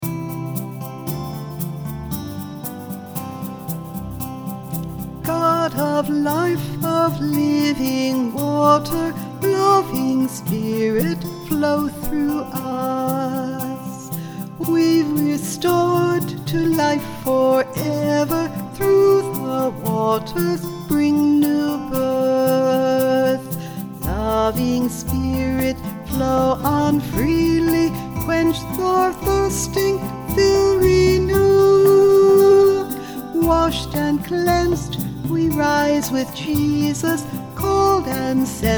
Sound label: God of Life Of Living Water VOCAL C; baptism of jesus